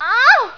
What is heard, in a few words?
female pain